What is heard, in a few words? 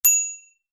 hint sound